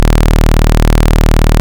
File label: Ob Saw, Synths; Multisamples; Ableton Live 12 Lite; Core Library